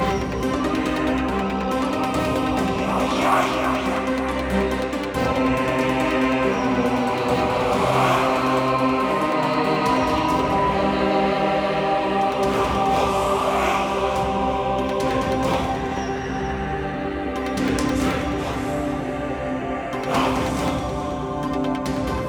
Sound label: Enemy Turn